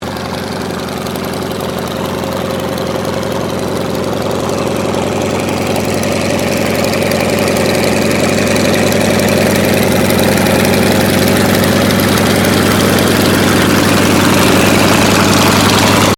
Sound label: AIRCRAFT YAKOVLEV YAK-52 IDLE, Training aircraft; Russian Yak 52; Airplane; Vehicles